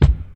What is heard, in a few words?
00s strong bottom end hip hop kickdrum d sharp